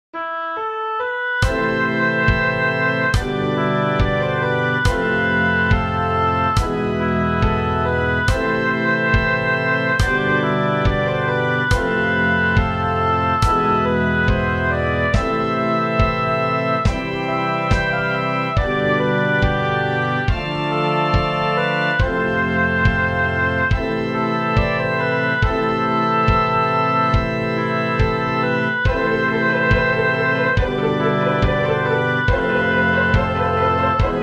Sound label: Rumba